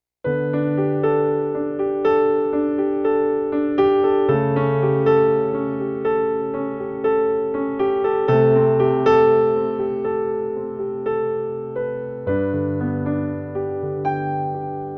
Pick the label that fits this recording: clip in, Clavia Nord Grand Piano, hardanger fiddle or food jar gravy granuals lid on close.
Clavia Nord Grand Piano